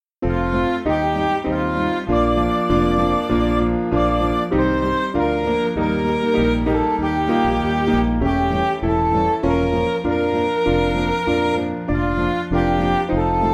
Midi; I Love Thee Lord; Mountain

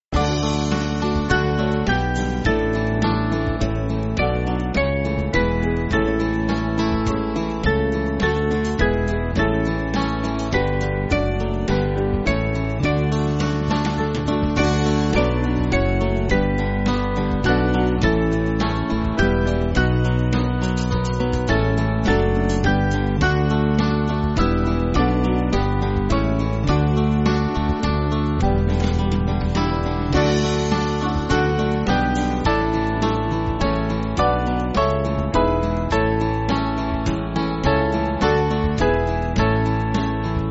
Band

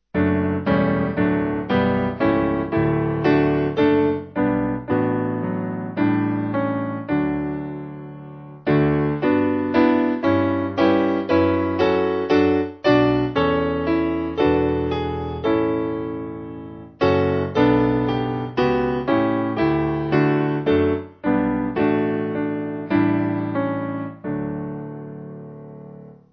Lord Christ Whose Love, Piano

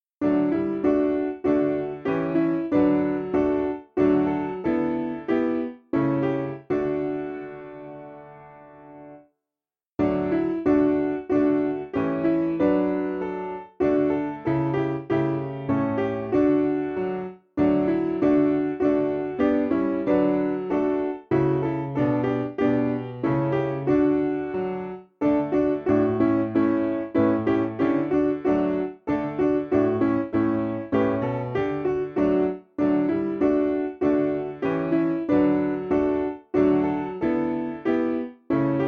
Piano

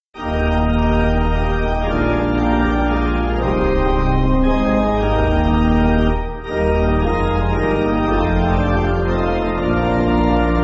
Pipe, church music

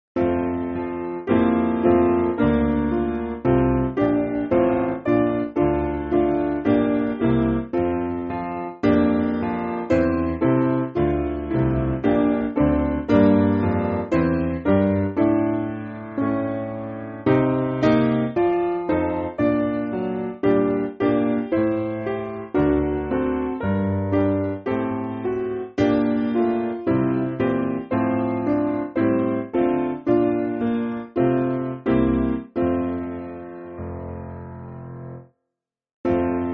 Piano, church music

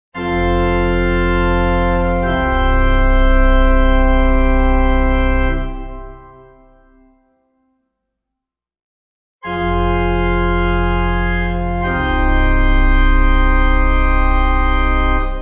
amens simple C high pipe

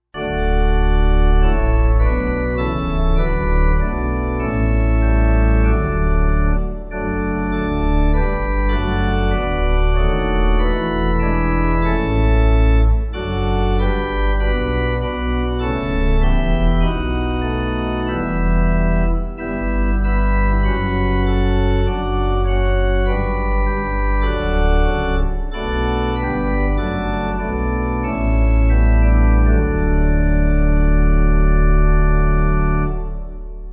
Pipe

